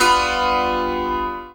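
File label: ZIMBAL PIZZI; Best Service; World Colours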